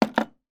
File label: household cup place down on bedside cabinet